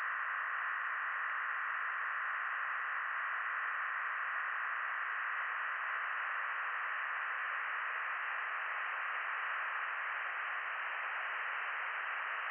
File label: from radio, 7177KHz